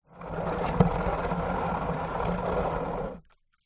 plastic tub large shallow water inside drag concrete